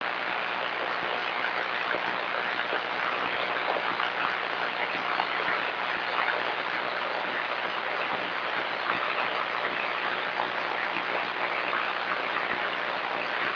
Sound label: buzz